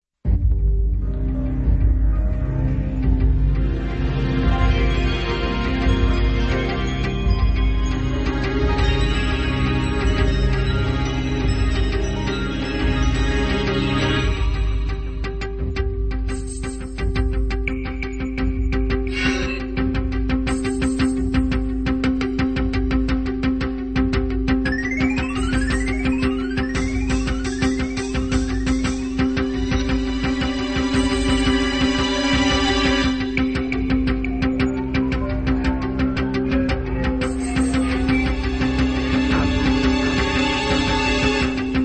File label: bgm; ChaosFantasy